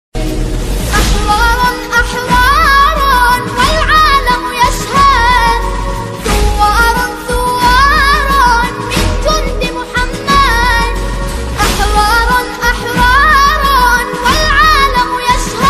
nasheed ringtone arabic ringtone islamic ringtone; rock

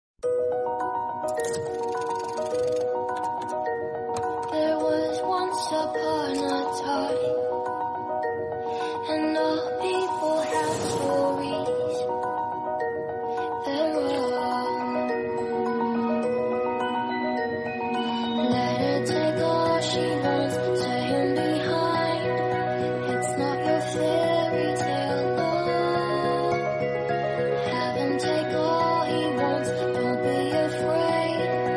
korean drama intro ringtone

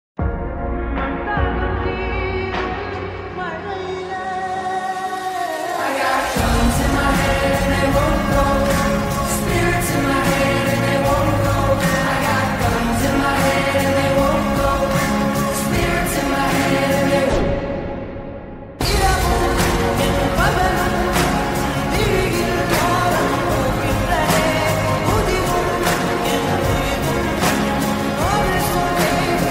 villain beats ringtone

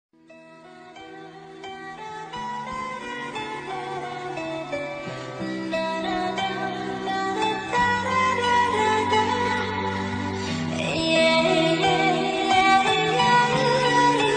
love bgm; paiya

